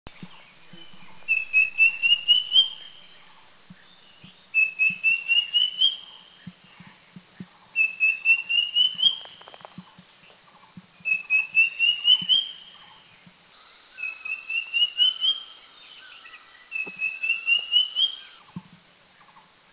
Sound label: Drongo Cuckoo; Asian Drongo Cuckoo; Mishmi; India